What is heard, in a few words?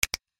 Click S7